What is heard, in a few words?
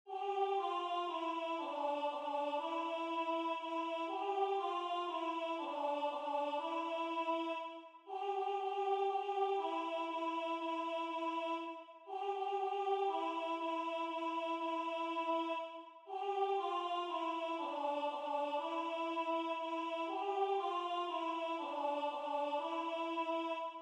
Soprano; La Caccia